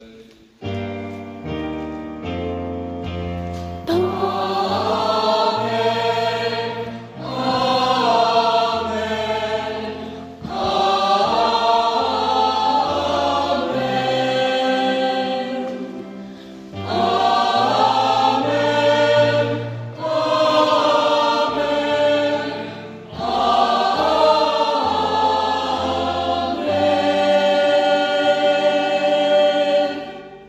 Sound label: Amen